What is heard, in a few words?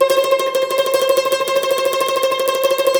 CUMBUS TR, Cumbus Tremolo